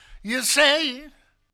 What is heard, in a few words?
Funky Mama Vocal, 70s Analog Funk; The Funky Mama; Sonic Foundry (Sony Creative Software); What it Is! 70s Analog Funk